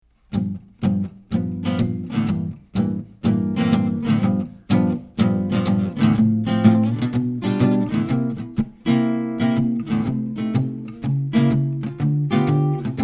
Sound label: Jazz; EASTMAN ACOUSTIC SOUND; straight signal with no additional EQ, compresson or effects; Cascade KnuckleHead